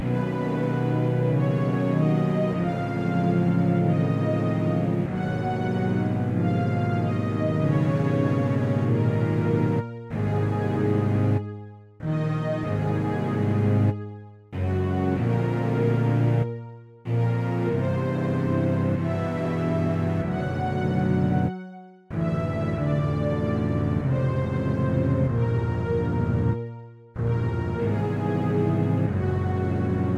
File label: cave music